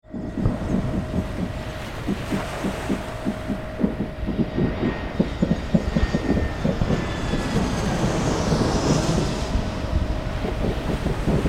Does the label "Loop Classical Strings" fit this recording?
No